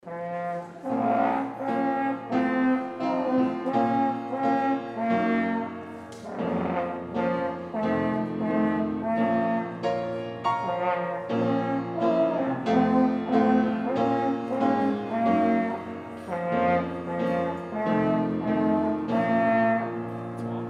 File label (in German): Bläserensemble; Musikschule